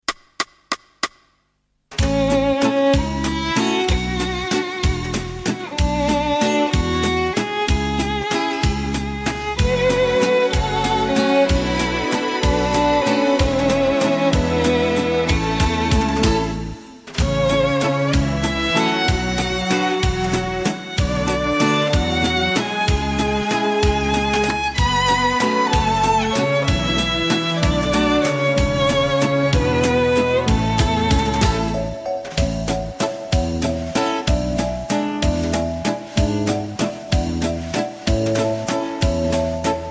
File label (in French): Valse Viennoise Karaoke Playback Instrumental